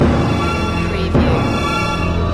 orchestral suspense loop

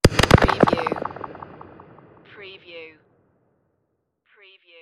space cracker burst

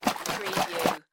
Bottle Shake Liquid; sound-effects-bible

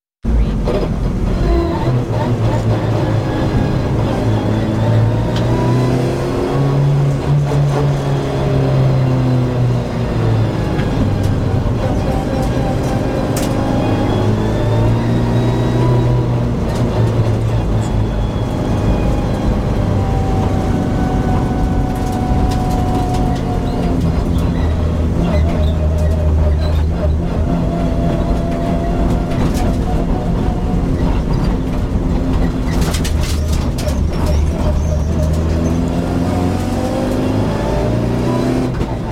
Rally car race excerpt; beanstalk audio

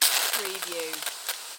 Pesticide Can Spray; Household Sounds / General Household; sound-effects-bible

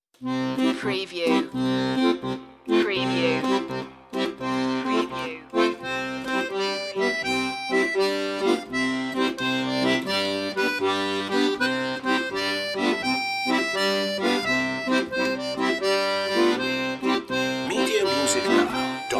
i saw three ships solo accordion

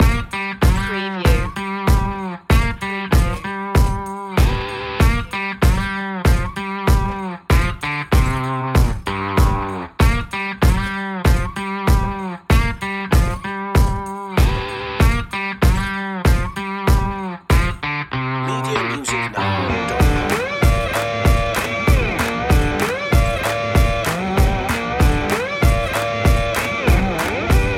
footstomp swamp blues loop

